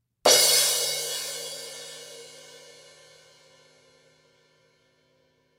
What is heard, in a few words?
S Thin Crash Crash, cymbal